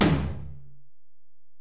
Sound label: Tom, Alesis DM-PRO